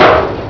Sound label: impact